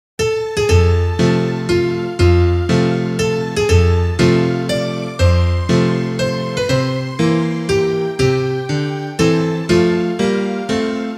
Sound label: instrumental